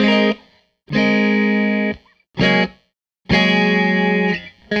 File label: Tele Guitar Loop